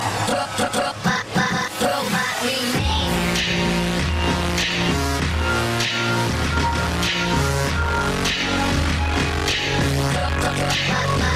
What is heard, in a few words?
robot, hip hop ringtone